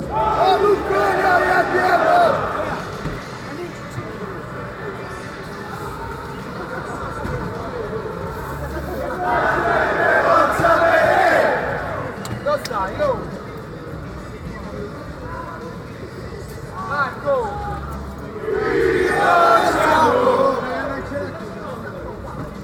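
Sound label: chant, football chants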